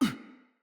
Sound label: Pain, Battle Barks; Envoy